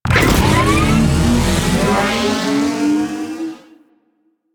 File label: Hoverboard Boost Slow; Warframe; DE; Vehicles; Venus